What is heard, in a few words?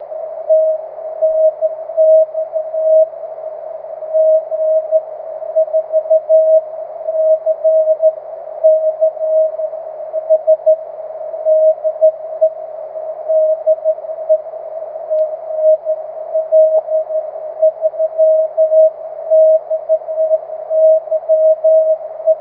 cw; 23cm